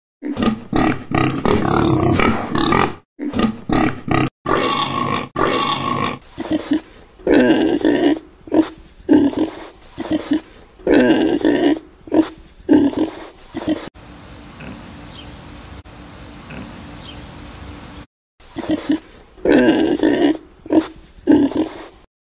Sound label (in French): CRI de COCHON COCHON qui CRIE; animaux